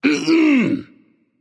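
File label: nonverbal clear throat; smite; voicepack; merlin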